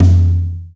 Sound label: NATURAL P, DRY+FAT KIT